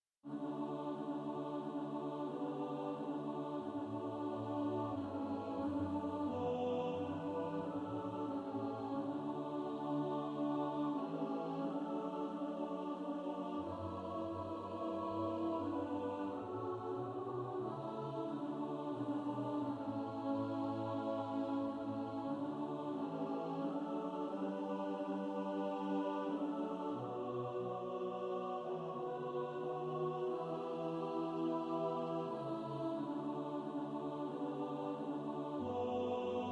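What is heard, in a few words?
alto, practice music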